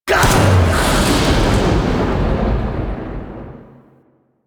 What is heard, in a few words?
Rising Blades Impact B; Warframe; Warframes; Pax Duviricus